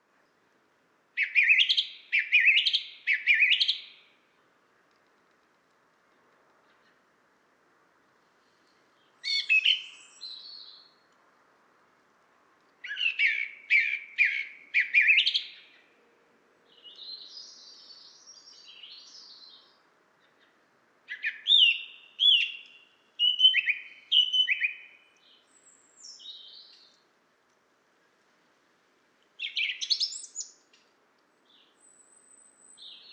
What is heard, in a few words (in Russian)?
pevchiy drozd turdus philomelos; nochnyh ptits